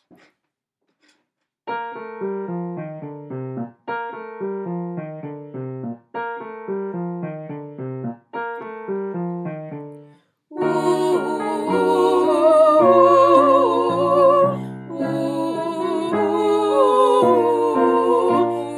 wedding procession practice track sop, alto, tenor